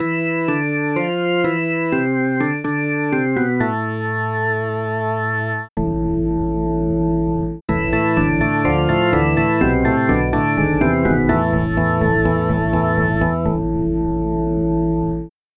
rock organ

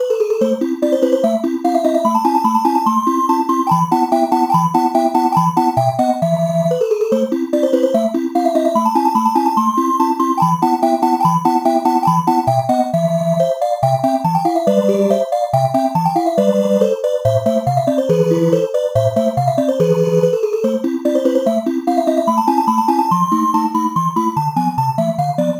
bottle chiff

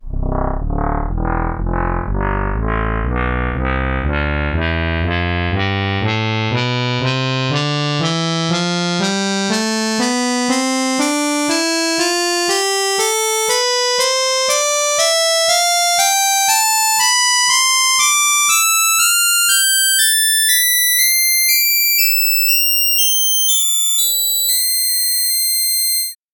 muted trumpet tonleiter samples

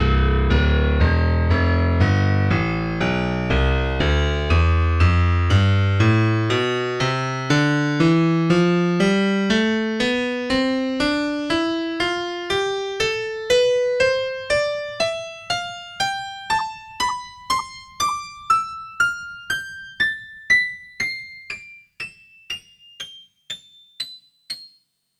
honky tonk; tonleiter; SpectMorph Evaluation #4

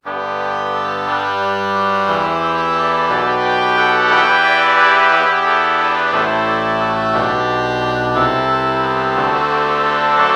oboe trumpet lpc true bach morph

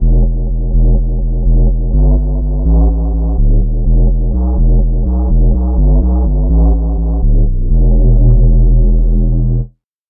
ocarina bass

